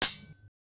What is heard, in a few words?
Sword Hit